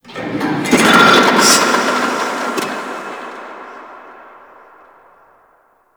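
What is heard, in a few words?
Shipyards Distant Machine, Ambience; Warframe; Grineer Shipyards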